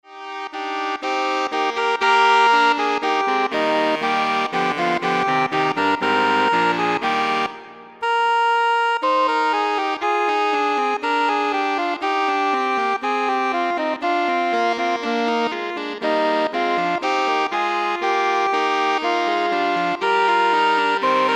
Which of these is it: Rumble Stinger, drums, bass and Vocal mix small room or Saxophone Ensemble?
Saxophone Ensemble